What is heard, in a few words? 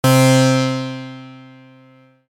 NH harpsichord